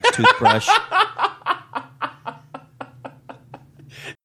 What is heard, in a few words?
awesome laugh